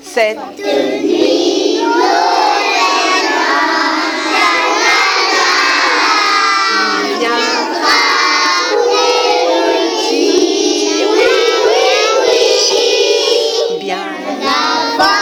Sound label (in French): lalala, chants